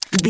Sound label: gun pistol insert mag